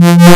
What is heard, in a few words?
sine to saw